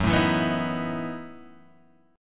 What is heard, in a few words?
F sharp Minor 13th, Guitar, Open G